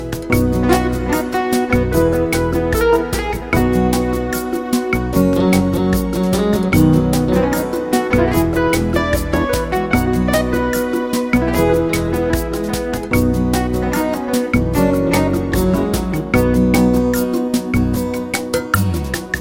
Unplugged Ballad

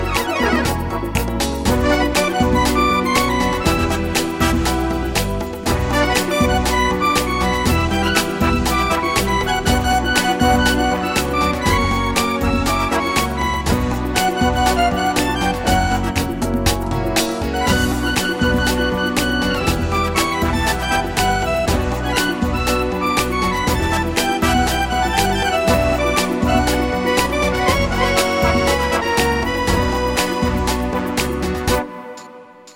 Modern Tango

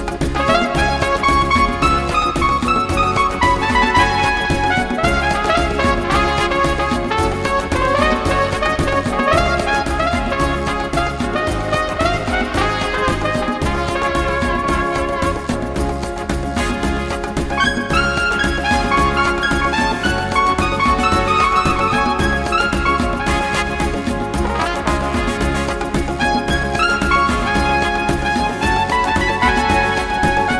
Paso Dance

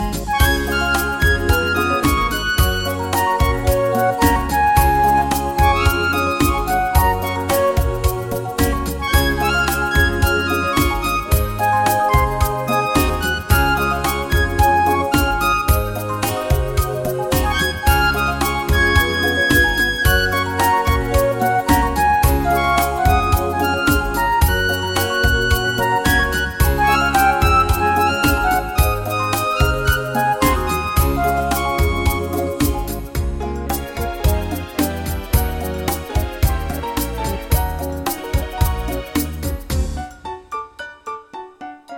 Soft Rhumba

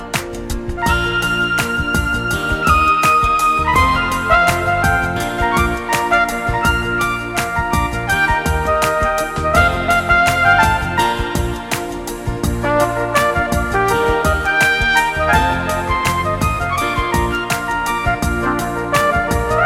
Swinging Beat

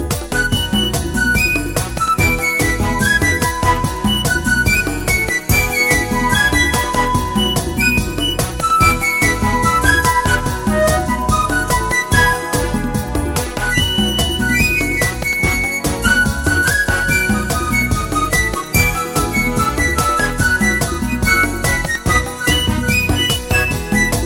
Latin Rock

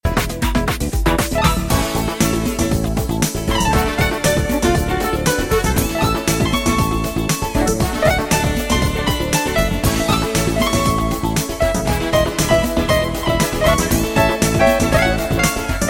Level Funk

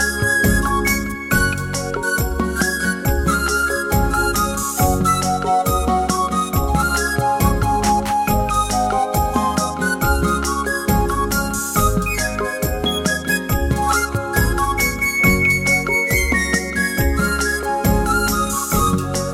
Bossa Nova for Lovers

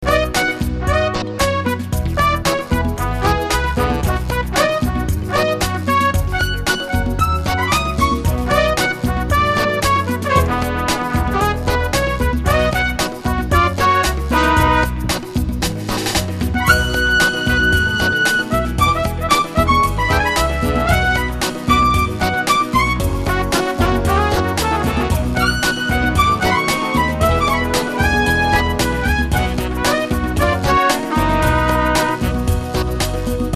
Soul Ballad

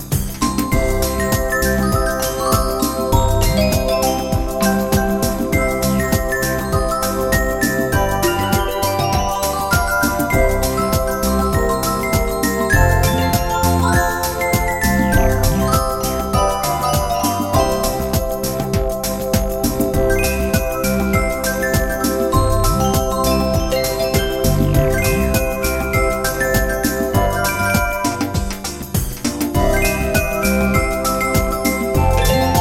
Pop Reggae

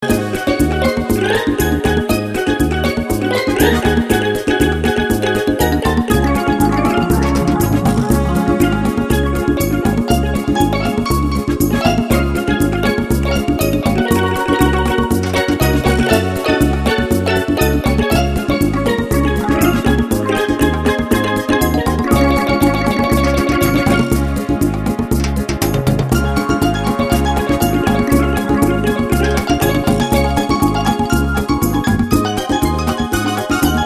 Caribbean Dancing

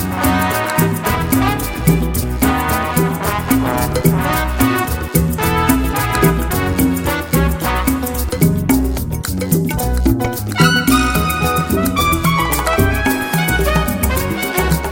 Afro Cuban Jazz

